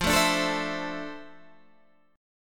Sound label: F Major 7th Add 6th, guitar chord